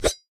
knife throw; Blind Drive

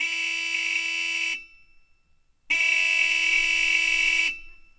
horn car, street traffic; best service Blue Box

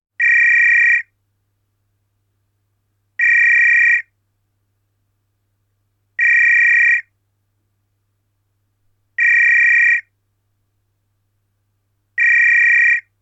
Telephones; Industry & Commerce

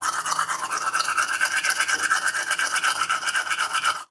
Brush Teeth, Bathroom; 1001 Sound Effects

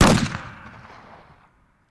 Bullet Impact; Sound Ideas; Guns

